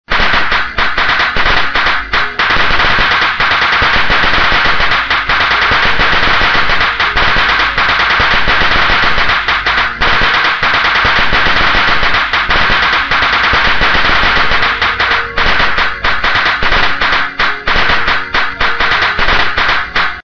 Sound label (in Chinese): dianzi bianpao sheng